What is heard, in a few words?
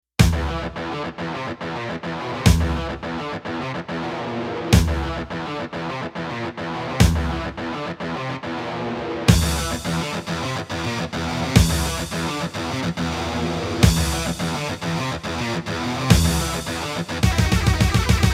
Roland SC-8850; Doom; Doom Revisited